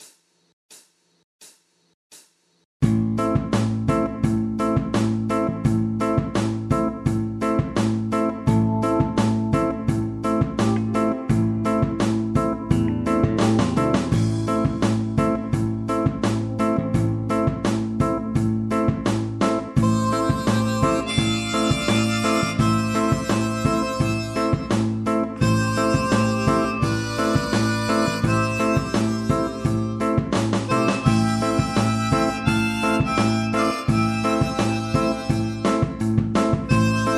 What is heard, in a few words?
guitarmonica harmonica